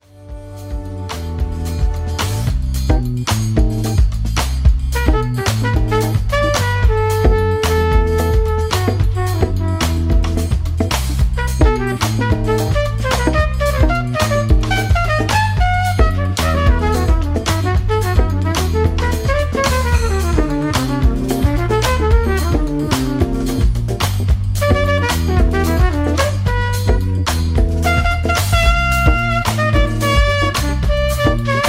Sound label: trumpet solo only; jazz trumpet